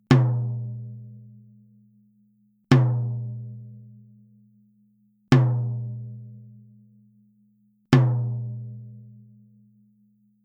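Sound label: TOM Power Center Medium, drum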